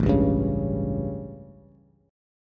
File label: A 7th Bass, chord; strummed; JGuitar